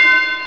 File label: block